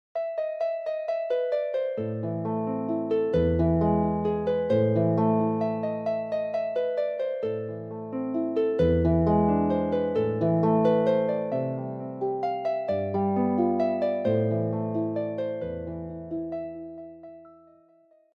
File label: Harp